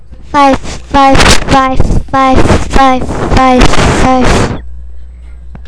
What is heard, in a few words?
pipe